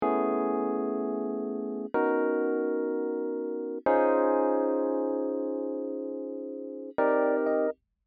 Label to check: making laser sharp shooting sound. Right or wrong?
Wrong